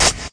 hi hat, BeatBox Male

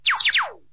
triple laser

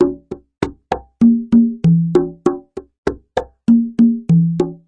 97 bpm conga distorto maxed; 091 to 100 bpm

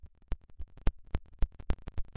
disturbing clicks; Weird and Interesting Hits; single hits

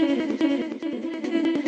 afro stutter, vocal or voxy loops

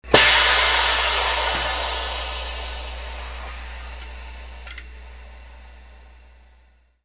factory air release, Factory Percussion

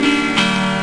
twotone guitar note; single samples; Guitars

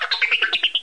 laughter; R2D2 Construction Kit

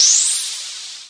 Electro FX; Ueb HE Electro FX

